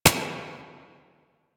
Turret Low Clip; Weapons; APC; Warframe; Lasrian

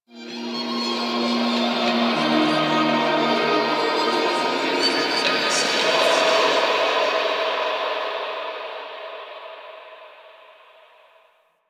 Ampthitheatre Echoes; Warframe; Quests; The New War; Ambience; Zariman

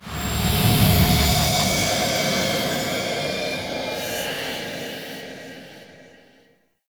Title Card UI; Tau; Tau Circles Of Hell

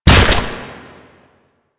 Proto Infested Ancient Step; Warframe

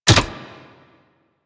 Ball Catch; Warframe; Missions; Tenno Ball

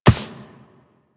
Walk Default, Footsteps; Warframe; Operator

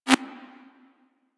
UI Common Grid Row; Warframe

